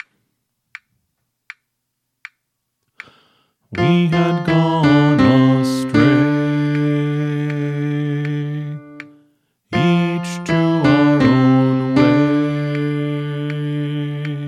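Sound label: Song, Choir Practice Tracks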